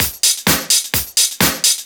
Straight Loop 128 BPM, House

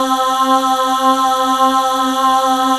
CLEAR VOX C3, CLEAR VOICES

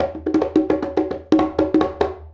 Conga Fill; Drums; Essential Percussion

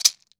MARACAS; Cuba

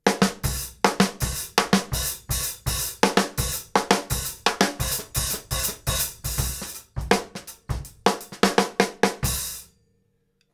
KICK SNARE, Live Snares

